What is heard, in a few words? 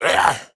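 choker damage attack deal damage grunt vocalization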